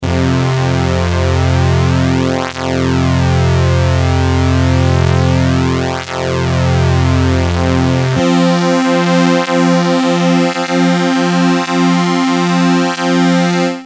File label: prodigy mxr fd